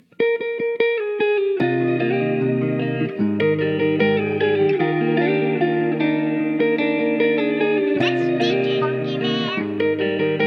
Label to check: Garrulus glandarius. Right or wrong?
Wrong